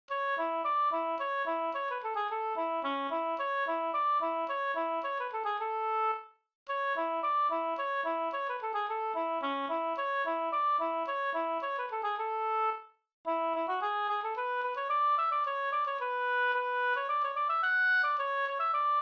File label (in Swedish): slangpolska fr sodra Dalarna